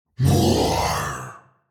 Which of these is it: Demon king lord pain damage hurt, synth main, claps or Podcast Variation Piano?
Demon king lord pain damage hurt